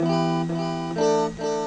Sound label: delay, guitar